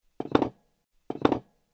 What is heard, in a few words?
move sound; dyslexia; ka ka ki kii